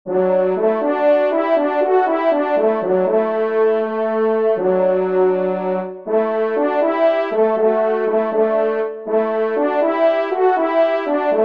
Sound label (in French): Fanfare; Loisirs du Chasseur 4 2e Fanfare; Henri Sénée